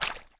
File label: Footstep Puddle; viewfinder